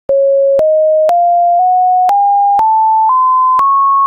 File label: doremi diff; musical scale